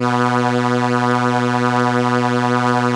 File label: ARP B1, STRENS; Sonic Reality; Vintage Timetraveler